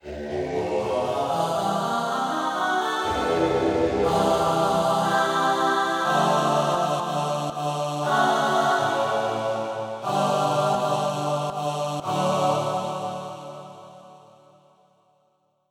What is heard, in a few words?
Slow Ahhs; SoundFont - Traditional, Voice & Keys - Volume 2